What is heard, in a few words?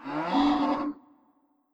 Bull; Legend of Keepers (gamerip) (2019)